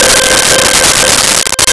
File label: Ice Rain C5; Nintendo; July 2020 Gigaleak